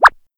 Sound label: Hopping Duck Walk